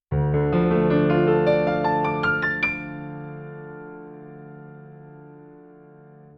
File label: nord piano 4 royal grand 3d reso off